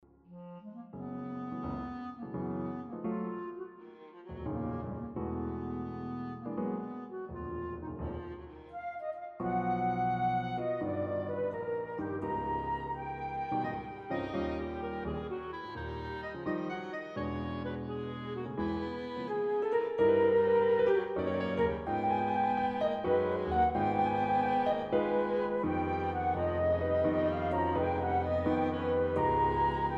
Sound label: Quartet